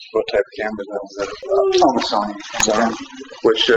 unknown moan; no response; Waverly Hills; EVPS; Spirit Chasers of the Unknown

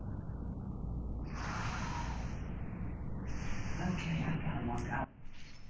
Scream or yell; EVP; Bruce Mansion May 6 2017; Lower Michigan Parnormal Society

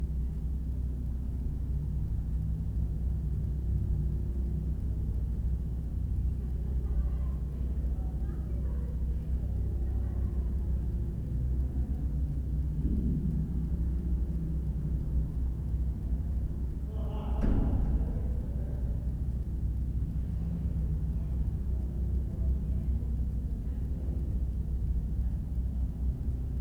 laughter in backround then unknown laughter; Eloise Haunted Tour; EVPS

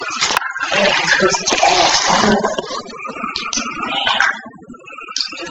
unknown cough and possible gag; Waverly Hills; EVPS; Spirit Chasers of the Unknown

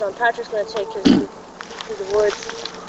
unknown sound while talking; Gettysburg Pa Culps Hill

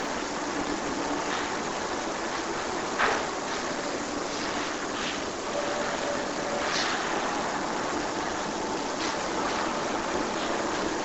unknown noise; Perry Schuch Hotel Saginaw, Mi; EVP

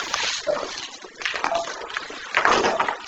Playing Piano; School House in Michigan

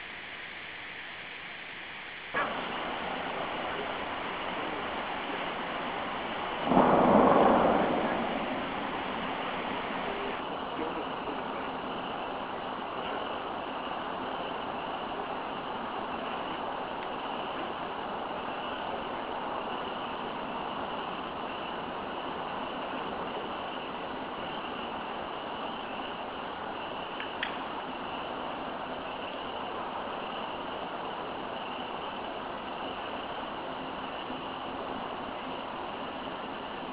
cannon first night; Culps Hill Cannon Fire and Whistling(old time music playing); Gettysburg 2009

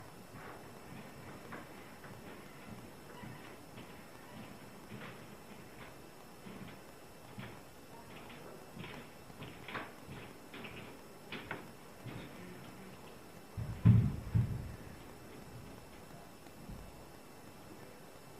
noise from upstairs then boom then silence; EVPS

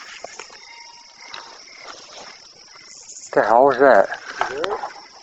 Gate opening up and being heard; Goodrich Cemetery

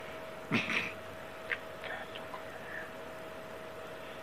we Laugh then voice saying get to; Undisclosed Location in Swartz Creek Mi; EVP; Spirit Chasers of the Unknown

